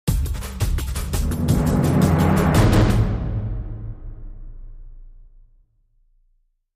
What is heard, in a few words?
Epic Energy Sting